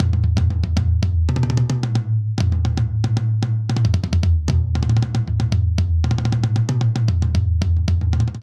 Polyrhythmic Dance Toms Solo 114 bpm; MIDI Clips; Ableton; Factory Packs; Drum Booth

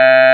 android, Digital Synths; Ableton Foundation FreePacks

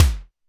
kick, drums; Ableton Foundation FreePacks

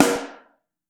SN3 Mono, Snare; Ableton Foundation FreePacks; Snare Designer

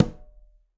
Conga B Mute; Latin Percussion; Drums; Drum Hits; Ableton; Factory Packs

